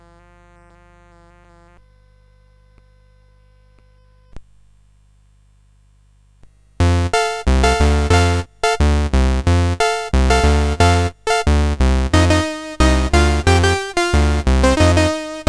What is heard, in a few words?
CSG8580; CSG8580R5